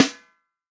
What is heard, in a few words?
REAL DRUMS FUNK KIT